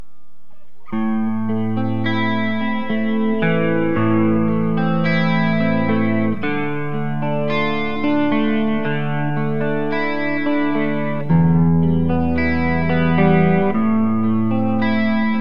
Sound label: sdcsgold neck clean specpick; burny; fugly clips